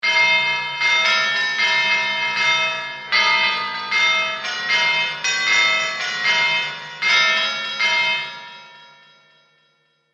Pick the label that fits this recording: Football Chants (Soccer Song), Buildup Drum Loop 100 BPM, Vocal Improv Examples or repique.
repique